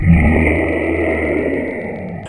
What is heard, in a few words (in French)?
grognement de bête